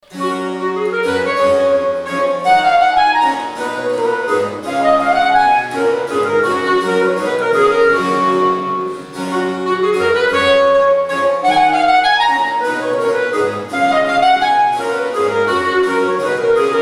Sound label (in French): trio menuets; Derochet